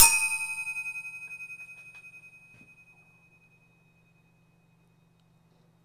Heatsink Ping

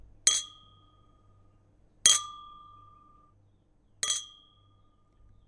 Glasses Clinking

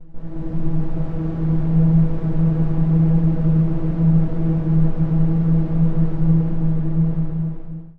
Steampipe Ambient Landscape C1

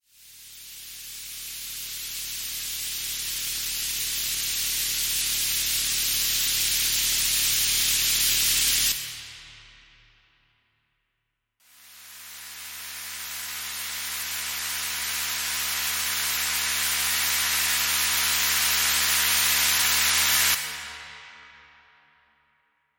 White Noise Risers

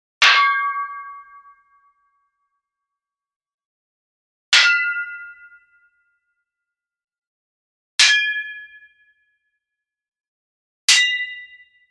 Cinematic Sword Clang

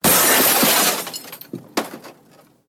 Glass Crashes Impact Glass Shatter Smash

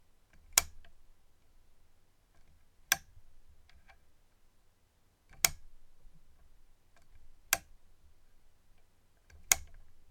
Lamp Click

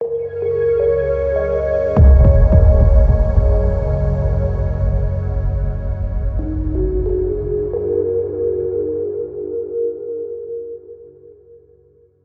Intro Minimal Logo

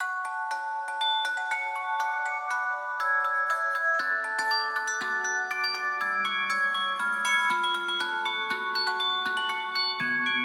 Cinematic Celesta Trailer Score

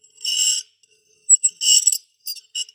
Scratch Glass

